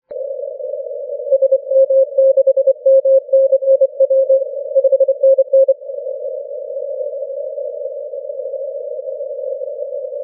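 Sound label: 30m continuous wave; 3g0zc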